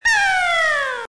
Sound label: drop; DoubleNewMagicTower